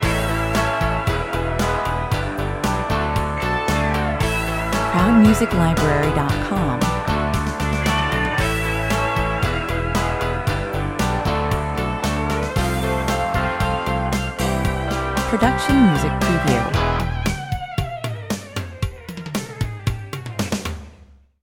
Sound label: Mid-tempo, riff based, seventies roadhouse track with an infectious and fun, party time v; 115 bpm; Features rocking electric and bluesy slide guitars